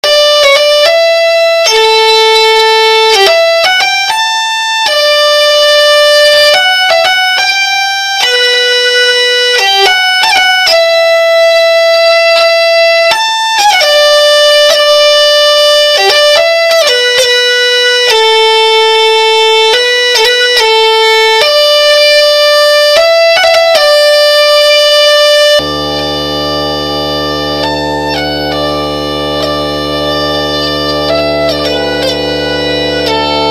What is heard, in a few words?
drone d, vielle